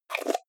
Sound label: jar plastic midsize close fast